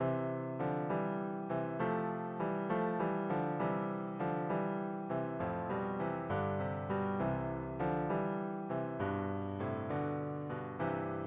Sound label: pastorale, cello trio